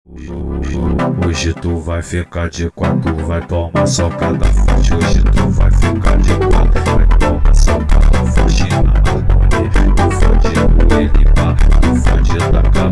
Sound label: funk